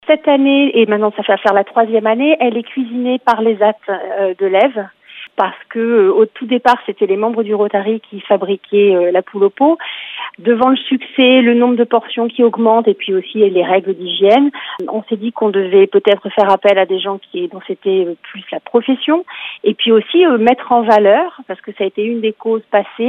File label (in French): poule